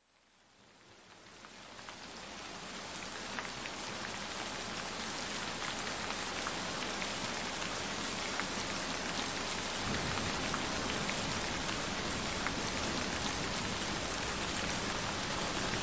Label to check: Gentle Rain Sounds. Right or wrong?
Right